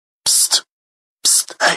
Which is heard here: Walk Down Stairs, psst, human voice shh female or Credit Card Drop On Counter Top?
psst